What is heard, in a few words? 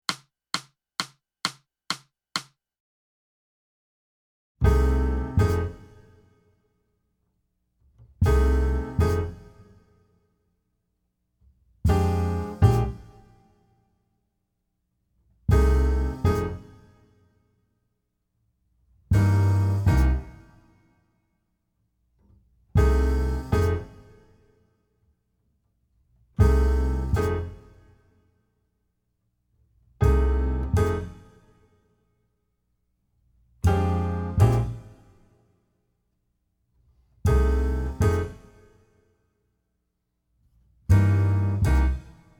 blue train rhythm